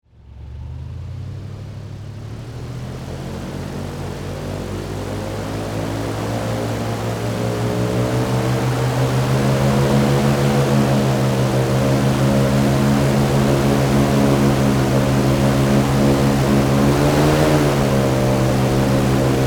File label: Airboat Multi Speeds, Transportation, Watercraft, Airboat, Multiple, Speeds, Long, On, Board, Perspective